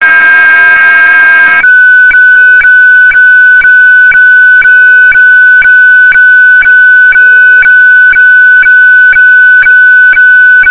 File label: fax